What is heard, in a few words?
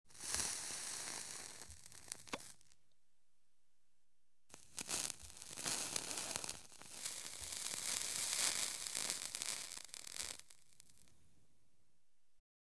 Smoke On Cigarette